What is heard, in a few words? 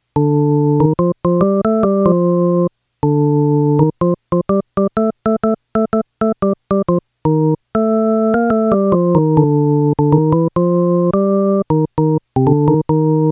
melody